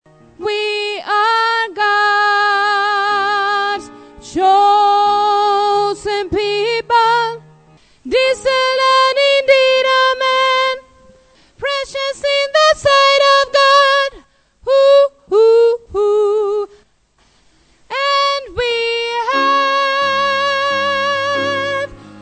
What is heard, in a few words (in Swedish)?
bas